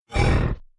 nosach Hit; metrocraft; customnpcs

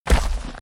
Walk; metrocraft; customnpcs; nosach

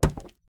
bullet hit wood; metrocraft

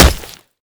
damage, player; metrocraft

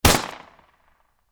kiparis shoot, weapon; metrocraft

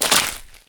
ice footstep; metrocraft

